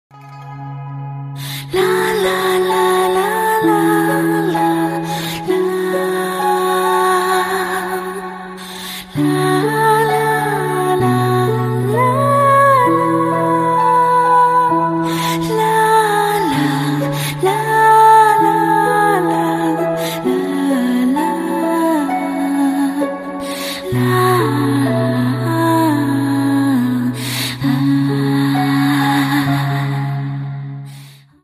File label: Chinese Song Ringtone